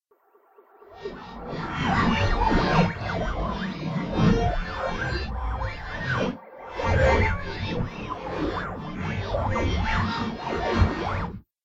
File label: Alien Radio Tuning; Alien Sound Effects